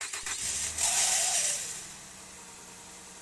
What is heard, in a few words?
water pump failure, CX9 noise; mazda cx-9